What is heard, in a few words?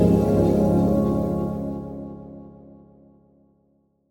Retry Jingle; Friday Night Funkin Davids Empowering Innovation